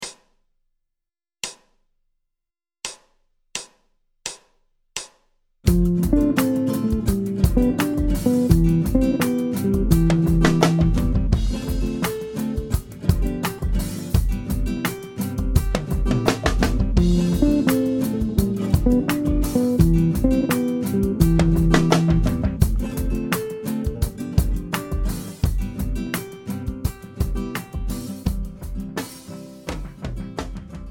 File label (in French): Phrase Cadence ii V7 I∆, guitare jazz; majeur